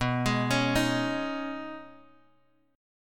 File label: B 6th Add 9th B down Bass Standard; B6add9 arpeggiated; chord shape; JGuitar